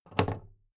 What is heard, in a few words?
big plastic case handle bounce heavy